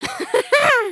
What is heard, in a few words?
water ride; emotes